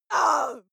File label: female lunatic scream pain short